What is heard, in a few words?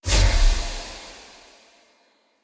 Magic Spell; Legend of Keepers